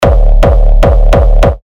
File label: Kick D; Garrison; terra kicks; Revealed Terra Kicks